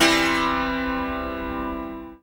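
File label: ZIMBAL PIZZI; Best Service; World Colours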